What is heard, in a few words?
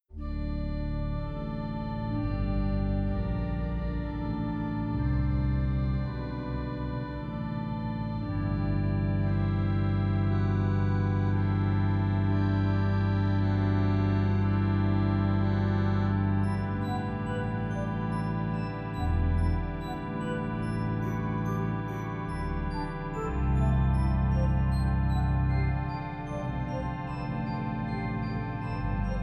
Celeste mutations, organ; organteq2